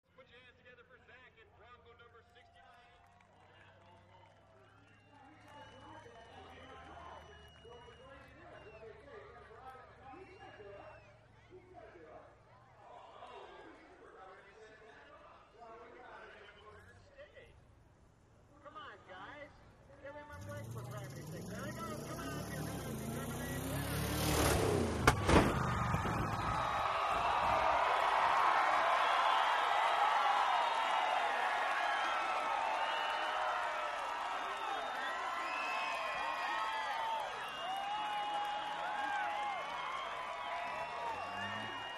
Monster Trucks; Jumping Ambience, 4x4 Pickup Truck Jumping And Racing Thru The Obstacle Course, Crowd Cheers And Applause; Shot M / S From The Obstacle Course.